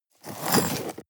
sweep small rocks short

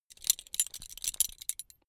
metal belt buckle clatter long heavy

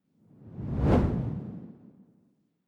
whoosh simple hard low

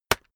keyboard external enter hit push

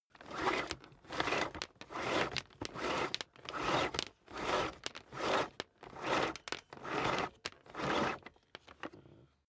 vacuum cable drag out sequence

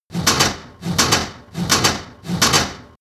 industry machine mechanic metal fast

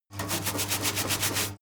mechanic sink running water brush

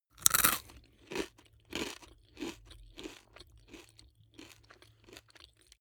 eat potato chip mouth closed normal

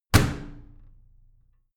washing machine slam shut

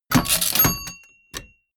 typewriter

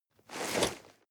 nylon leather medium short

